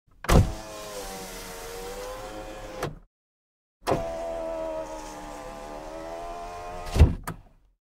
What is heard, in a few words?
car window, بسته شدن شیشه ماشین